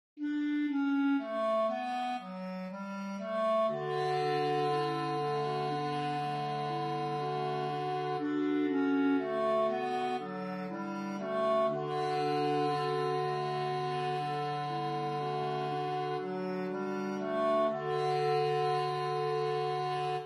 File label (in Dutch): Bas; Full fathom five